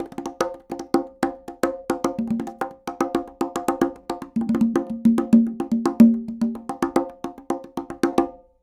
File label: afro conga loop 110; perc construction loops; SEISMIC Drum Sample Pack; Slate